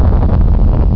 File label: Giant Snowball Short; olpc games; SnowFight